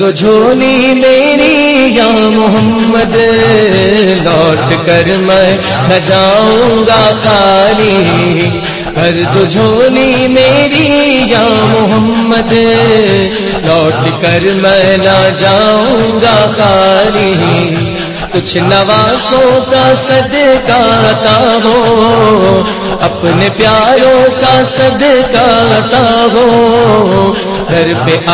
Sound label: duff ringtone, islamic